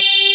TALKR FUZZ, BASSES; Pro-Rec - Synthsonic

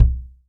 JBD MF, JAZZKIT

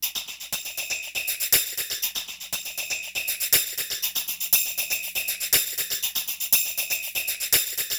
Tambourine Loop; Sonic Foundry (Sony Creative Software); Electronic Point Blank

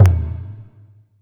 Perc, Alesis DM5

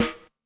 FX rim, Yamaha DTXpress-II snare

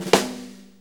6-8 Snare Flam, Drum Loops; Sonic Foundry (Sony Creative Software); Loops & Samples Drum Tools; Flams

